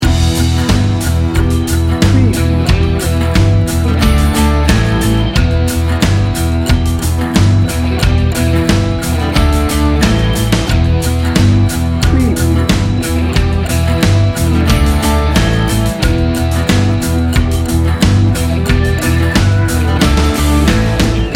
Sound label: southern blues rock loop